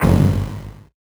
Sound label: VocoBit Noise, 8-bit; musicradar; 8-bit bonanza